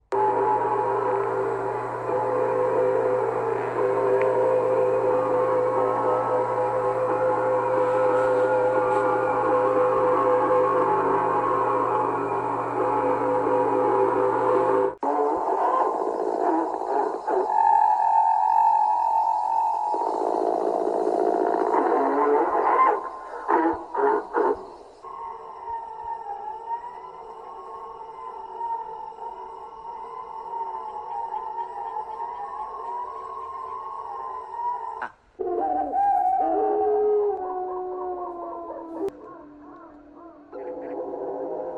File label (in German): akustischer Terror; Korea; An einer Grenze, weit entfernt, sind Tag ein, Tag aus, Höllengeräusch